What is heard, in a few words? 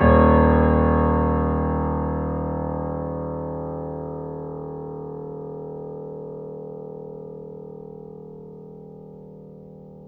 Mp D#0 off, Grand Piano off mic; AKAI S6000 CD-ROM - Volume 2